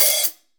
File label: HH, Drums, HI-HATS; Best Service; Real Mega Drums VOL-1